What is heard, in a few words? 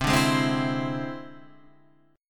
E Augmented 7th C